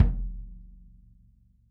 kick

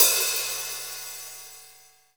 hat slosh

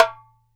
DARABKA, Mediteranean Traditions, PERCS

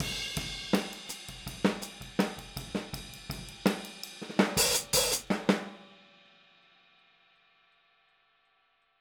Boogaloo - Outro - Hi-Hat, 82 BPM; Sonic Foundry; Drums from the Big Room The Mixes

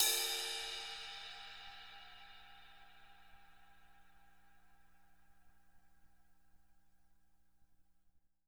crash tip

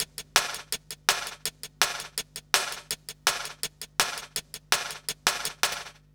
165 break, Explosive Jungle

